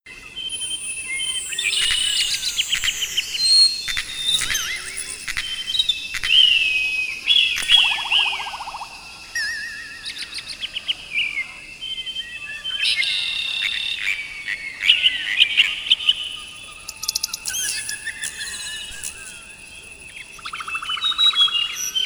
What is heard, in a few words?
Birds in the Woods, Ambient; rayman 2 remastered soundtrack